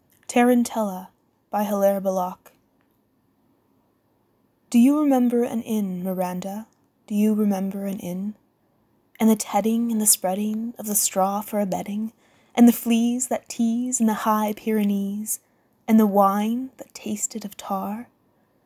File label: Tarantella